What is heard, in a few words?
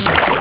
water; ST-XX Amiga Soundtracker Sample Packs